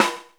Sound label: Yamaha RX5 rim, Drums